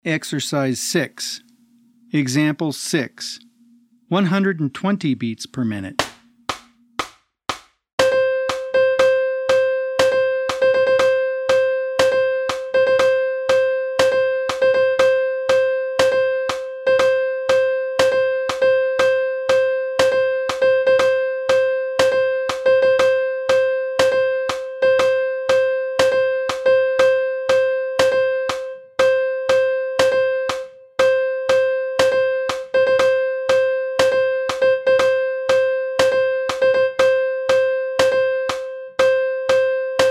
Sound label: 120 BPM